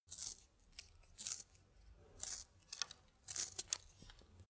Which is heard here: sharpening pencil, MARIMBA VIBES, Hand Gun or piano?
sharpening pencil